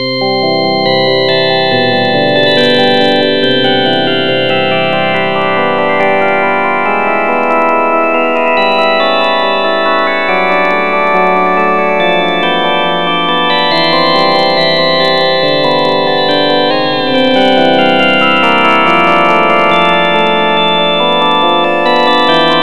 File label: TV Program – Cooking (unused reverb)